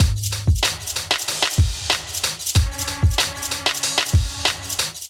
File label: Kit, Drums; Ableton; Factory Packs; Chop and Swing